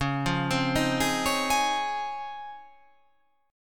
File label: C sharp Major 9th